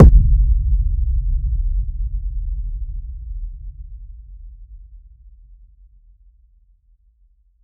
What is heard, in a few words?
kick like indian movie no